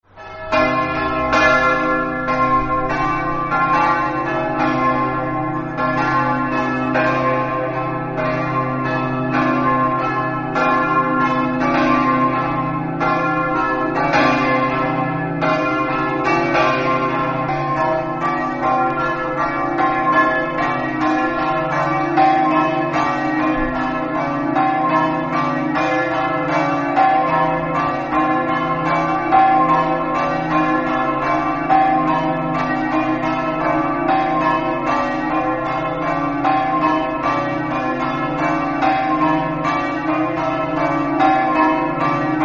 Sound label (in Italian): treppo, campane